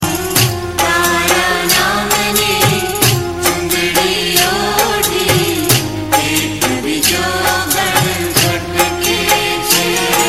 gujarati

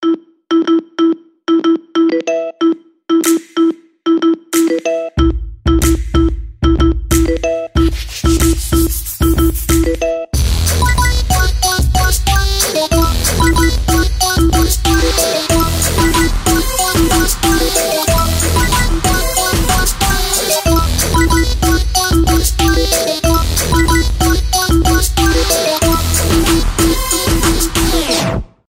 Tri Tone

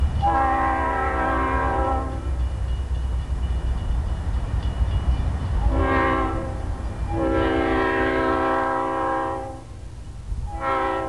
Leslie S5T Air Horn

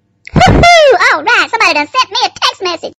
Whoo hoo message

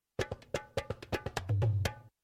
Dholak

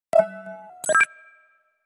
Reply Iphone

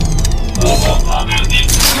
Transformer sound

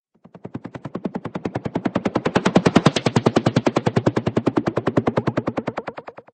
Helicopter Military

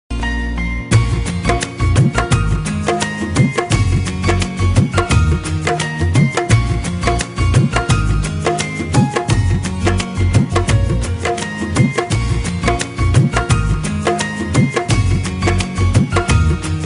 Romantic

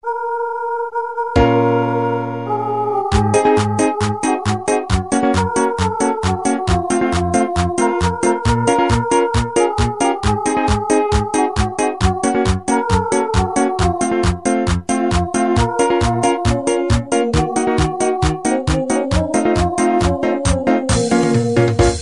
New Rabab

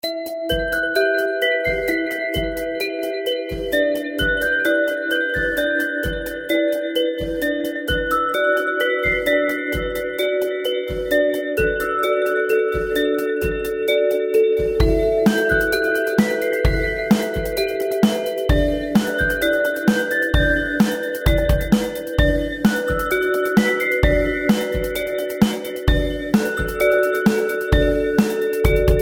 Piano Glass Ringtone

